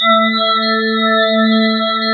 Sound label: AMBI PAD